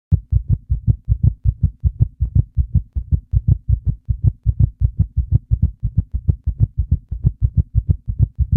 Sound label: Heart Beat; fractured minds